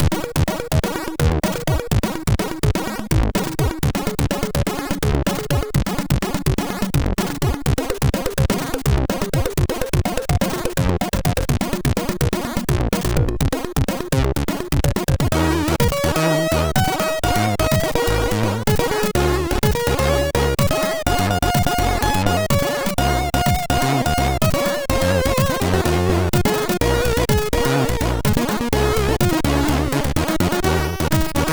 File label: MOS6581R3, sid; La Musica